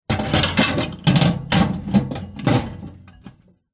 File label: household microwave oven glass plate turn table put in